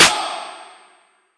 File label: snare